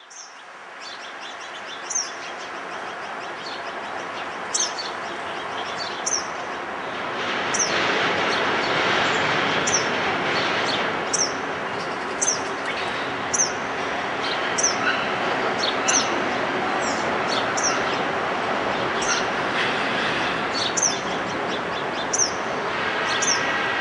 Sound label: kingbird